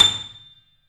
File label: 3D Boesendorfer, Pianos, A; E-MU Producer Series Vol. 5; 3-D Audio Collection